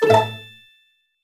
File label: Spot Diff Coin Award